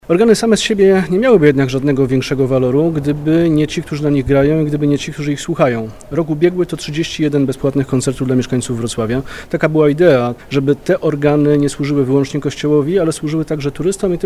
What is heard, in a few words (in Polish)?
organy